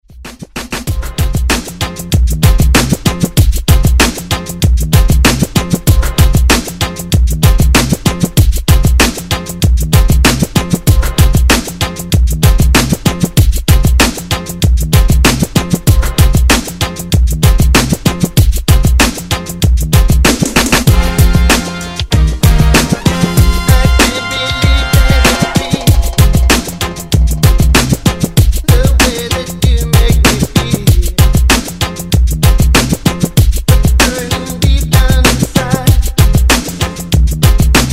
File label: Reggae Ext Redrum